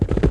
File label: Horse Run; Civ4 warlords